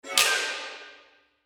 short gliss down

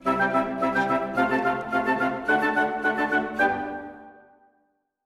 Friendly Ident Woodwinds; SoundsCrate

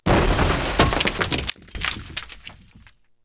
bomb explosion

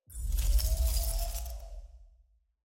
ice magic spell; soundscrate

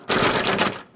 door handle shake; SoundsCrate

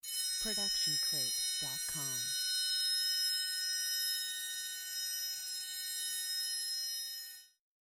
triangle roll

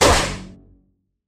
sci-fi blast

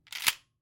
Shotgun Close; SoundsCrate

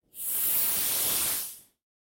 snake hiss

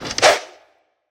arrow draw and release stylized

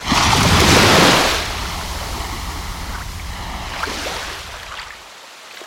waves; soundscrate

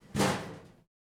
Metal Footfall; soundscrate

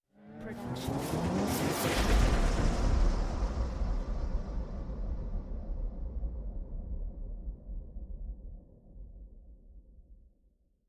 Hydraulic Lifter; soundscrate

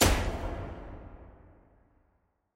Gun Shot Tunnel Reverb; SoundsCrate

